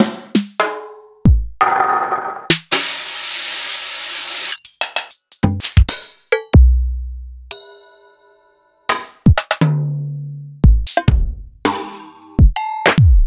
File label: EMU Orbit